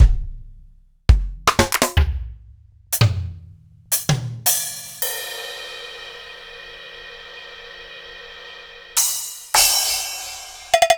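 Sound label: Roland MV8800 drum DISCO KIT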